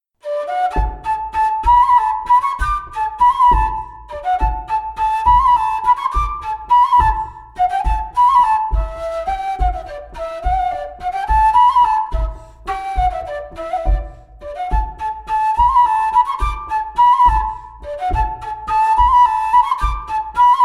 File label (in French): Bourree parisienne montagnarde